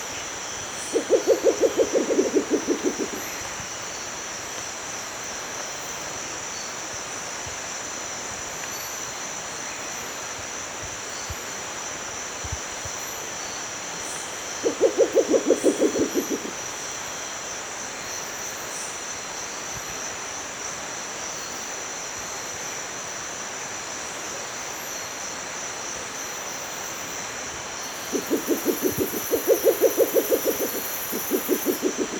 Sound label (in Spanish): Lechuzón de anteojos Pulsatrix perspicillata